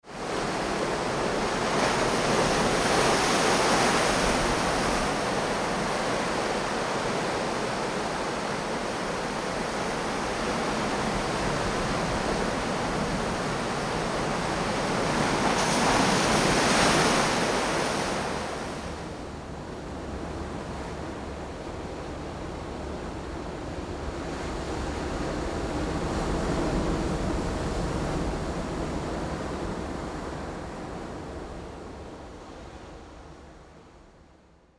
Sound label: Ambisonic Crashing Waves